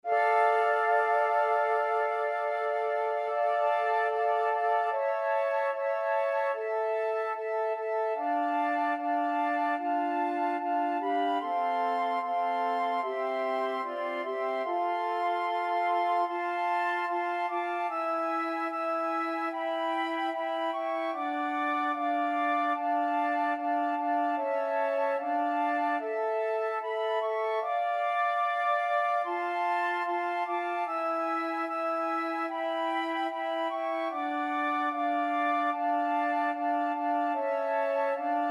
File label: flute quartet